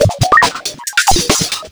COMPUTER, Big Beat Drumloops; USB Soundscan vol.25; AKAI

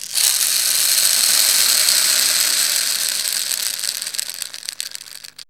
RAINMAKER, PERCUSSION; AMG - Vince Clarke Lucky Bastard

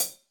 HH, Drums; Bob Clearmountain Drums I

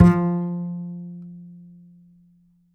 D, Upright Acoustic Bass

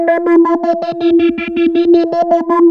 3100 E 5, Korg PS3100; Back In Time Records; Korg Universe VOL-1

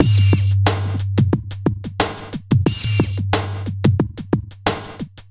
Smooth, R&B Drums

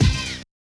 Yamaha DJX 2B, Hit

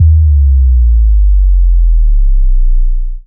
Sub Kicks, Bassdrums

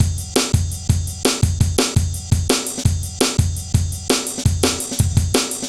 heavy live ride, drum loops; Zero-G Jungle Warfare 1, 2 & 3

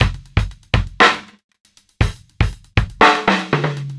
live beat; beats; Sonic Foundry (Sony Creative Software); Ugly Remnants VOL-2

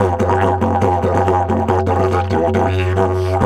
F 140, DIDGE RIFFS

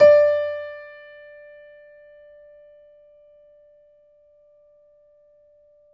piano; East West; The Ultimate Piano Collection

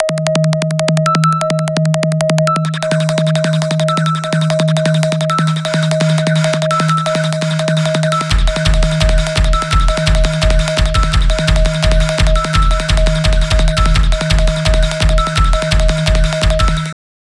loops 170 bpm; Masterbits - Tekkno Trax

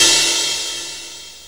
RIDE, CYMBALS

